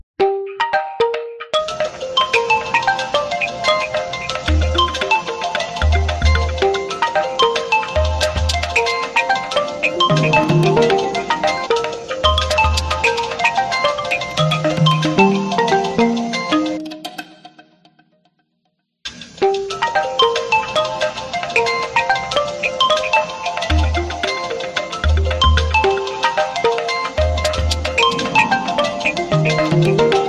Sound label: oppo ringtone